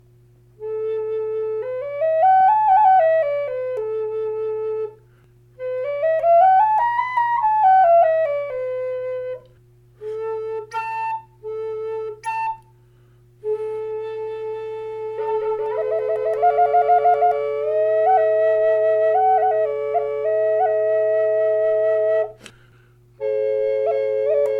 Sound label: A4 DRONE, flutes; KESTRAL